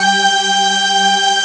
ORG G, ELIXIA ORGAN; Best Service ProSamples vol.10; House; AKAI

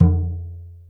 MALAY DRUM; Spectrasonics - Heart of Asia CD2

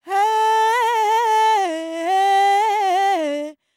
World Voices, INDIA; ILIO; Vocal Planet

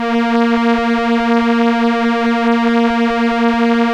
STR ELKA Cellos, Elka Strings; Roland LCDP09 Keys of the 60s and 70s 1

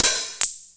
Hihat; FutureMusic

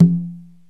CONGA SET; OMI - Universe of Sounds

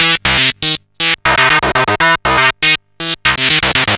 Analoop, Industrial Analog

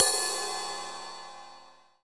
RIDE, MONO RIDE CYMBAL

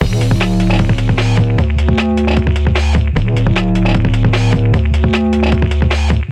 Hardcore Breakbeat, 152 Dmin

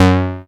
SYN, Microwave; Sound & Vision - Gigapack I CD 2

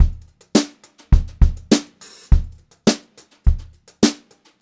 BEAT, Brushed Drum Loops

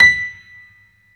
Stereo Grand, Keyboards

